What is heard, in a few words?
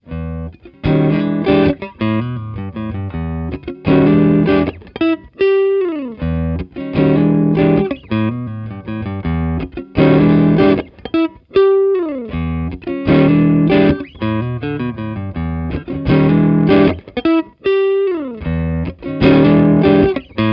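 Electric Guitar Cone Centre; HF Beam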